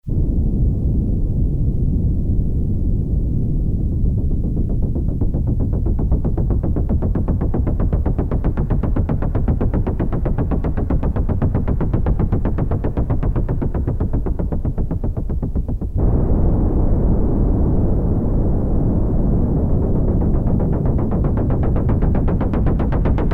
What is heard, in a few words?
TRAIN CHUG, Roland Jupiter-8, Factory patches